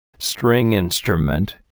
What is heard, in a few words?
string instrument